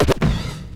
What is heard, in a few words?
SCRATCHES